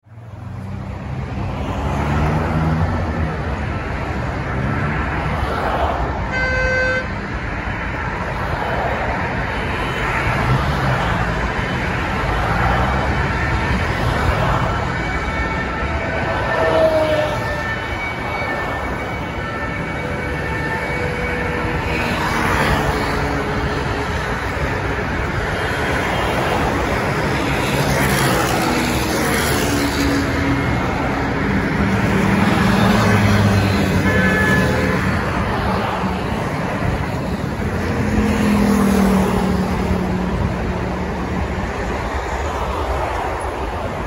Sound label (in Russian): avtotrafik